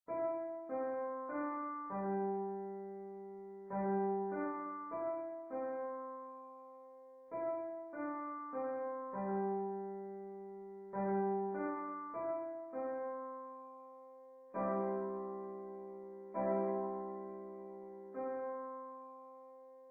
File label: Dotted note Chimes, beginner piano music